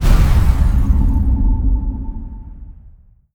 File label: Teleport cast; Test of Faith